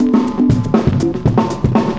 cooling 120, loops, 120bpm

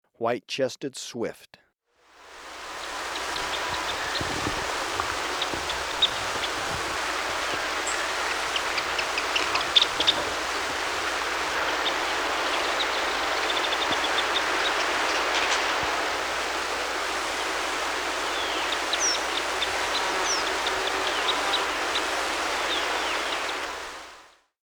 White-chested Swift, Birds of Ecuador; S. America

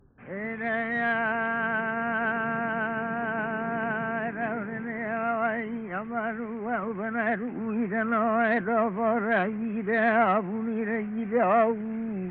Name chant for chiefs; World Music; Hawaiian; Na Leo Hawai'i Kahiko - Voices of Old Hawai'i

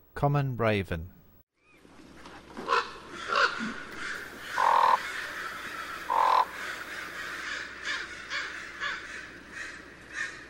Raven, Songs of Birds of North America; Western USA; Western USA Songbirds

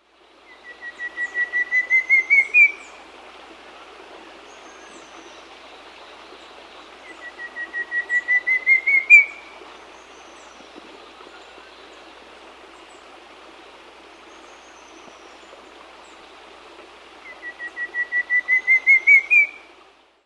Speckle-Breasted Antpitta; S. America

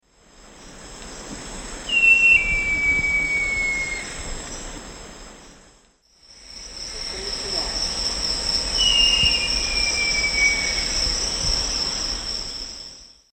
Jacamerops aureus, song; S. America; Eastern Lowlands

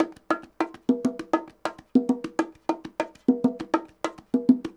bongos 100 bpm, latin; Sound Effect Library

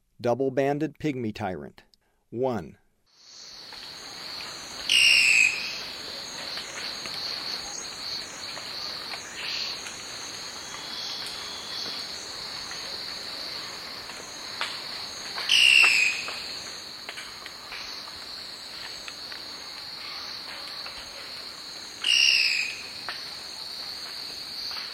Double-banded Pygmy-Tyrant; S. America; Eastern Lowlands

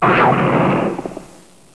COUGAR

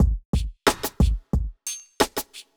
bellringer 90

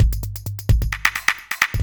electro kit 130

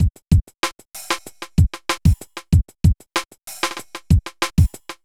kit; Sound Effect Library

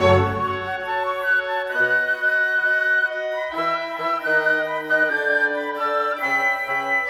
ORCHESTRAL

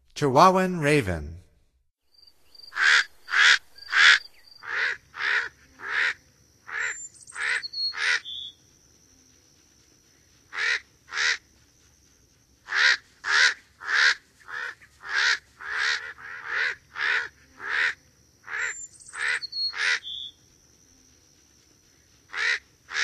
Chihuahuan Raven, Songs of Birds of North America; Stokes

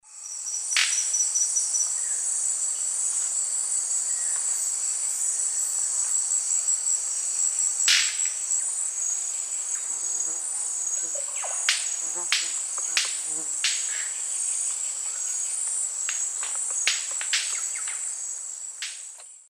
Manacus manacus, wing snaps; S. America; Eastern Lowlands

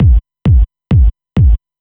Kick Loop, Dance Beats

